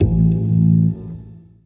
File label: new board sfx